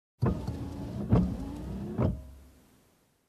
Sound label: Windshield Wiper